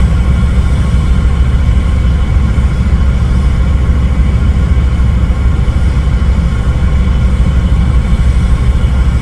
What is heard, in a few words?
jet cockpit, engines; War Thunder